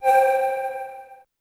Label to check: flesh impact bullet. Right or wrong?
Wrong